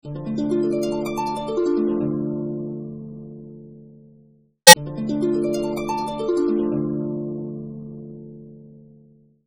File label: Harp; Chinese clones; Goophone Y5; iPhone 4S clone